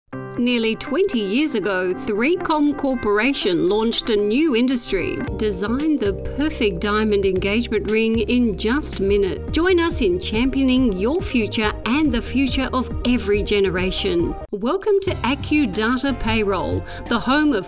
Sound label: Music Bed